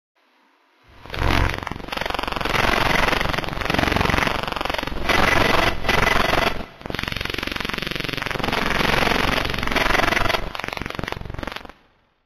Signal Audio

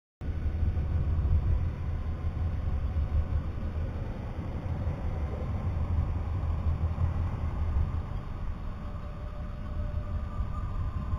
Signal

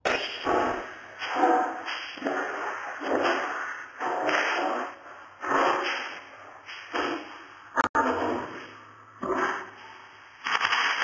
Signal

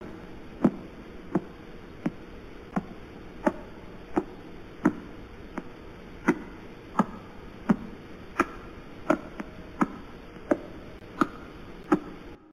Signal Whited

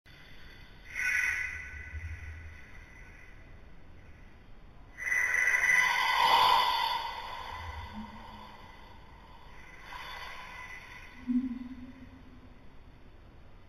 Signal Sighisser Audio

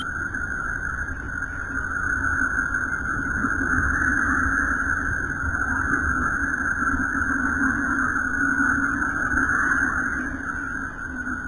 Signal Saturn

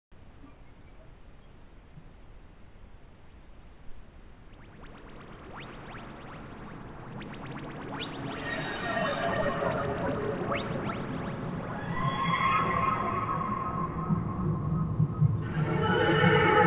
Signal Tardis

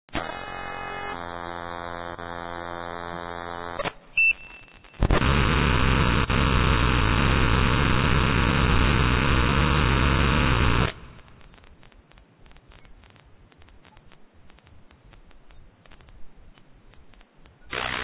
Signal

